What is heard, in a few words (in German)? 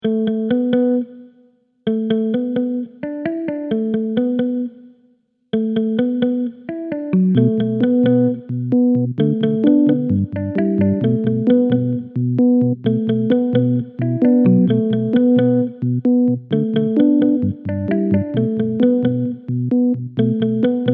Cool Thing ohne Beat